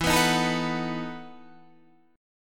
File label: E Major 7th Suspended 2nd Suspended 4th, Guitar, Standard; EM7sus2sus4 strummed